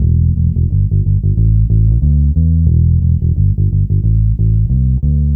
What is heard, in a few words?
Bass Loop, Ambient Grooves